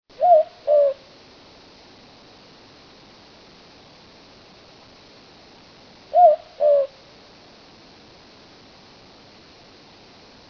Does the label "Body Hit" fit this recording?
No